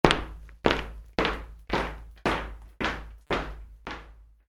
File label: Football boots walk, Soccer Boots (Metal Studs): Walking On Concrete; Sports